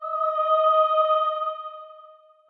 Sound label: choir